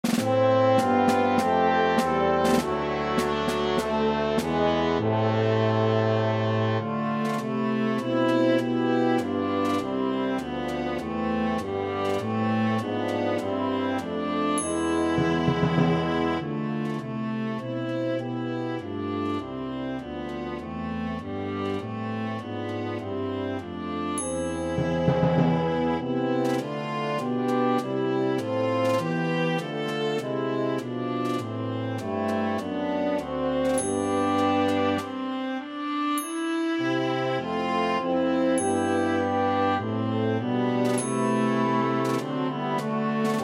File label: Viola Track with Melody; God Rest Ye